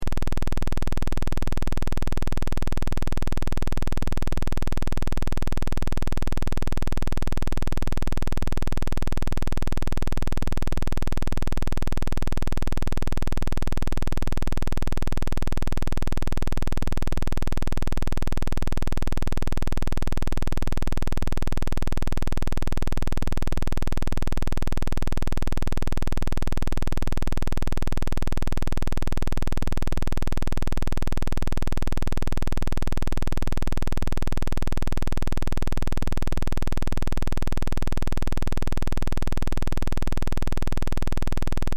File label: Test Tone Square; Wave 10Hz 60 Secs -18Db Loop; Blastwave